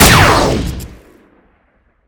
weapon; Dracaena DSG

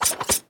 swing

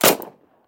weapon; Good'ol'smg

